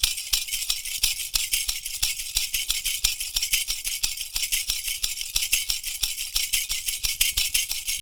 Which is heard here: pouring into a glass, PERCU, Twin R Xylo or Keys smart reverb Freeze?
PERCU